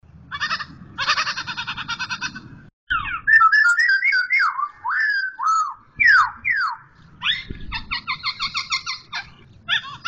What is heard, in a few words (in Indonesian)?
suara tarian poksay hongkong